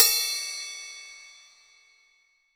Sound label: Drum Dry; East Collexion; Drum 1